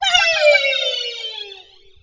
black hole shot; Angry Birds Space

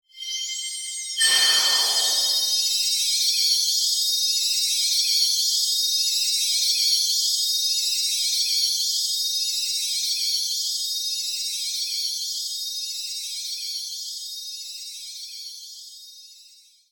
aura loop

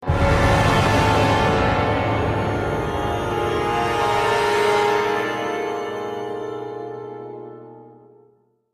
Combat Heavy Outro; Darksiders

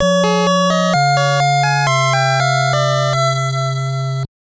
Get Item; Ys I Ancient Ys Vanished Omen; NES

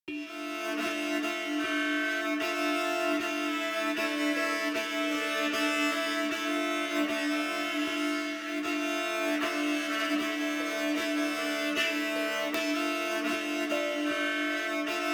Exploration; The Witcher 3 Wild Hunt; Skellige

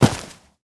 Jump Dirt Land

